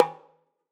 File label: Udu Skin Pressed